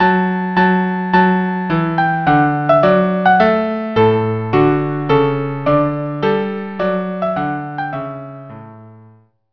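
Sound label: trumpet tune violin cello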